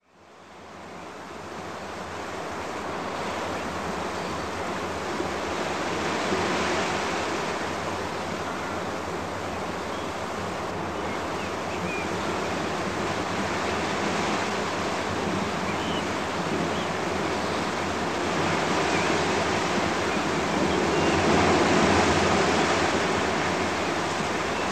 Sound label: quiet beach noises